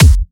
Bassdrum; Vengeance Samples

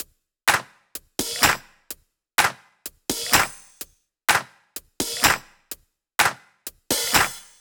126BPM Cubic Kit; Vengeance Samples

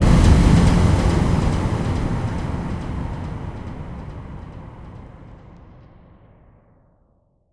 Bassdrums FX, FX Kicks; Vengeance Samples

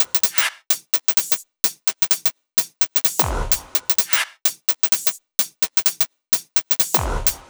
House loops 128BPM; Vengeance Samples

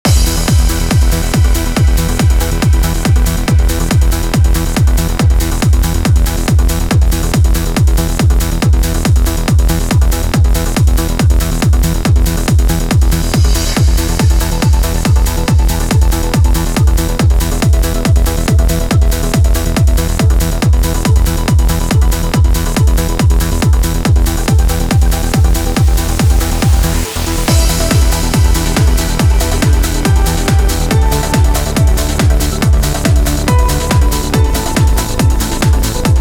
Complete Mix, 140BPM, E minor; Vengeance Samples

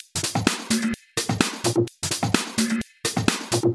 Minimal Loops

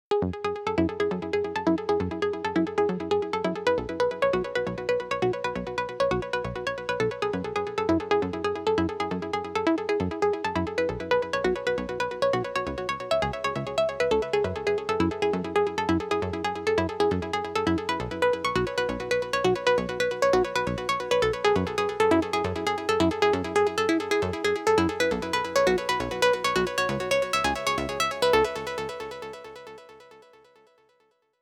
135BPM Sequence Intro WET, F# minor; Unlooped

